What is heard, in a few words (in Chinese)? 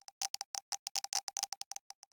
玄武岩三角洲咔嗒声; ambient